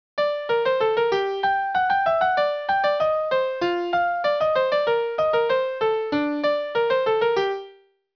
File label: G minor Fugue